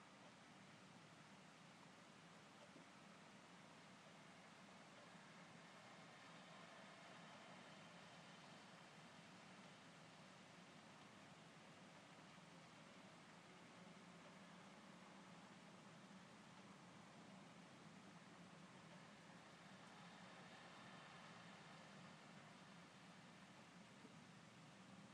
rain thunder over lightmoor

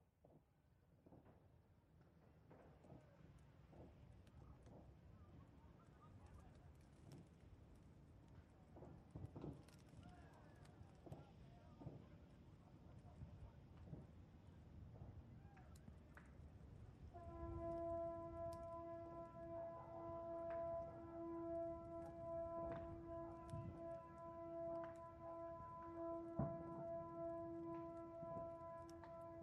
new year ship foghorns

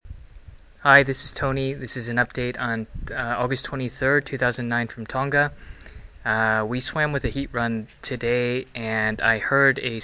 really strange sound made by a humpback whale

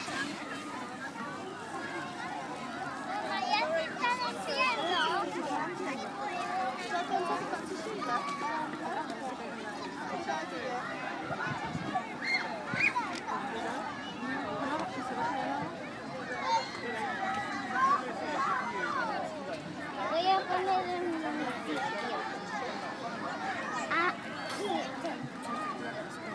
child's play

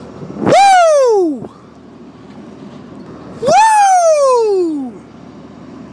wooooooo

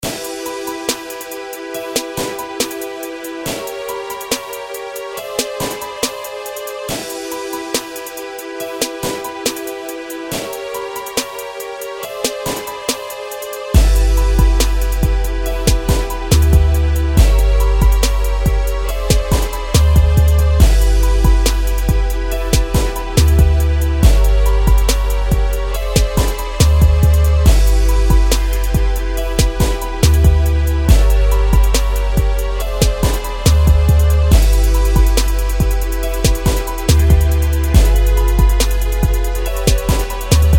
new beat

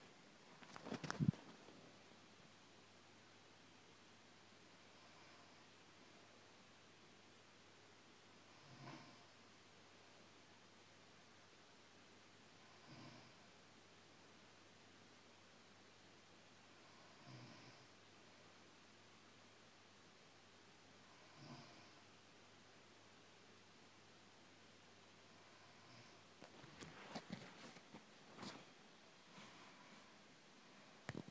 wife snoring

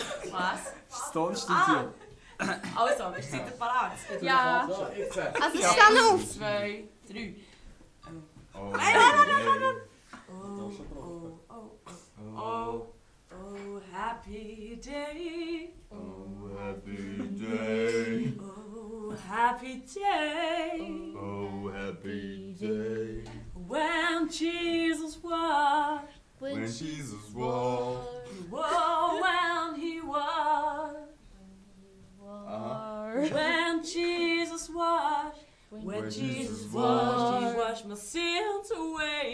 singing

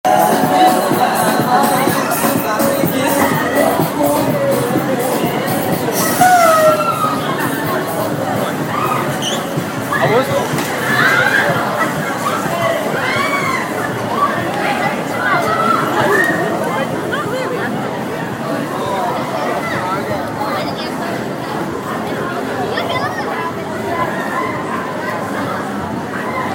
brighton pier

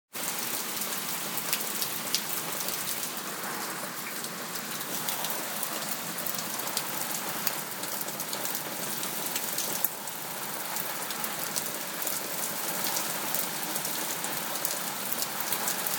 summer thunderstorm

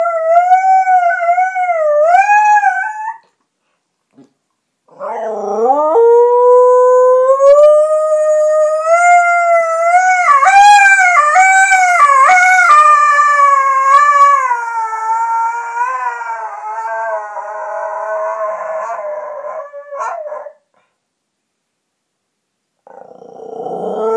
bear howling

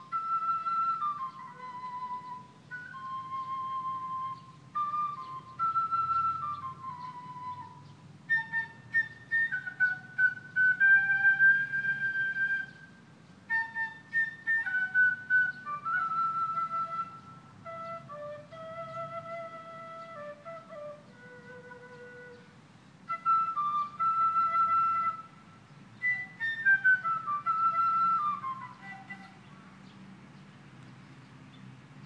flute

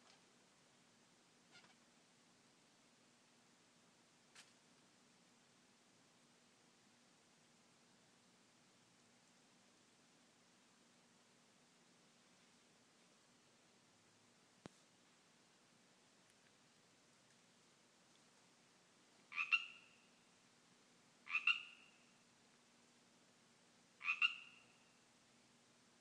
pacific tree frogs calling in my yard

